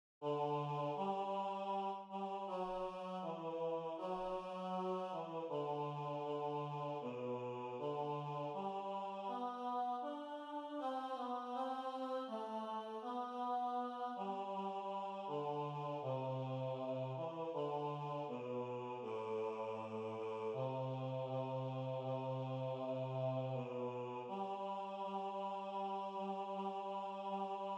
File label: Hotaru no Hikari Baritone Tenor